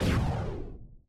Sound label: shoot Missile; Mindustry